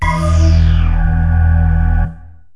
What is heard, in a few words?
Synth Drone